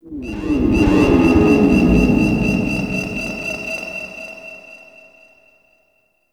Sentient Spawn Call; Enemies; Sentient Movement

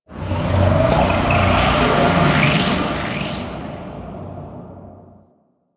Disturbance, Ambience; Warframe; Entrati

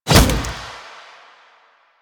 Punch; Warframe; Kuva Lich; Finishers

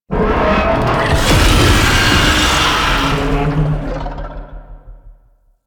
Tank Infested Vocal Ability; Enemies; Barks; Warframe; Lasrian

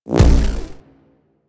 Hoverboard Land; Warframe; Vehicles; Venus; anim sounds

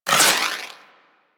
Wall Hand Slide; Footsteps; Warframe; Tenno; Wall Run

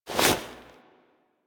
Foley Misc; Warframe; Operator

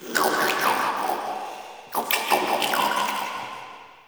Root Cave Creature Snort; Ambience; One Offs; Neathuns Root Cave

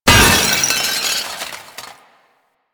Loot Crate Small; Warframe; Entrati

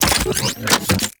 Briefcase Launcher Equip; Warframe; Weapons; Corpus

